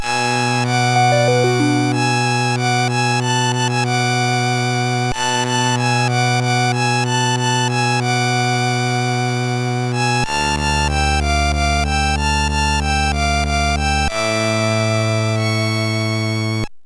sad tune; MusicLine

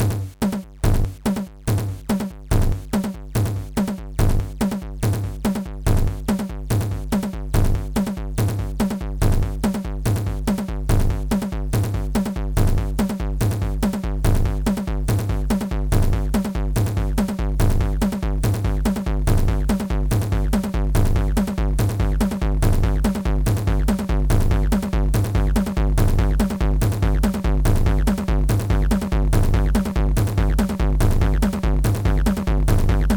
automatic drive, DigiBoosterPro; Acid Frog

